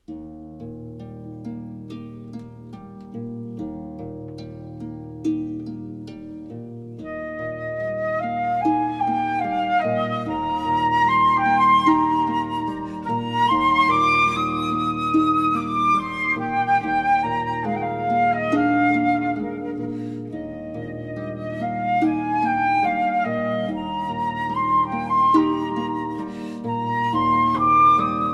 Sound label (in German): Harfe Flöte; Carmen; Bizet